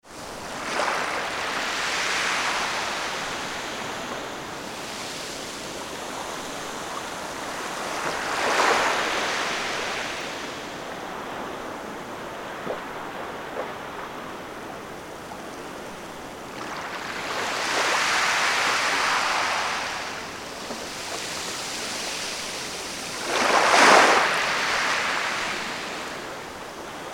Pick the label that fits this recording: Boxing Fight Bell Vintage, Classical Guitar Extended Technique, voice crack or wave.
wave